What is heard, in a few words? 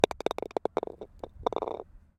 golf ball drops falls in hole; sports golfing; fvtt sonniss gdc audio